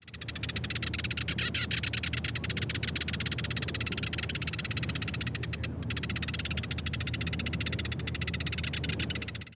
Sylvia melanocephala

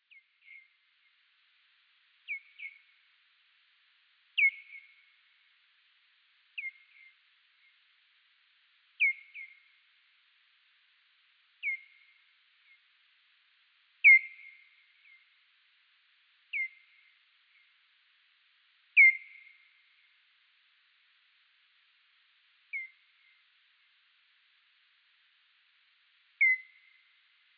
pipistrelle

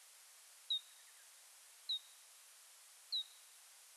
Pipistrellus nathusii

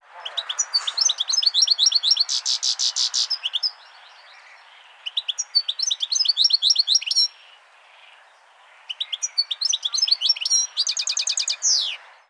Carduelis carduelis

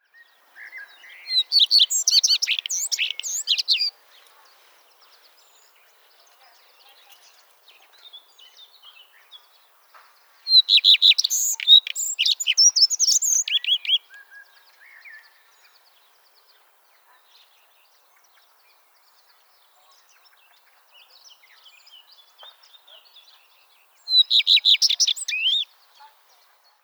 Phoenicurus phoenicurus

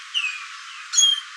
grackle

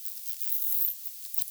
Myotis myotis

